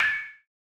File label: Equip, retro rpg menu sounds; leohpaz